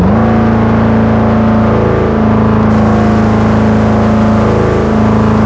rev down, vehicles; mere63; tdmcars

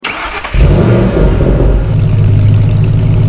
charger idle, vehicles; tdmcars; charger2012

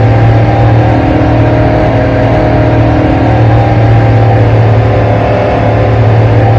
mid, vehicles; cvpi; tdmcars

